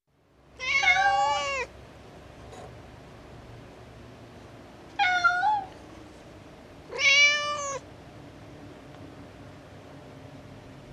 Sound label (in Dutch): Katten geluid